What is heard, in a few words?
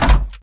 Mvmt FS Walk Default; AI Stalker; Titanfall 2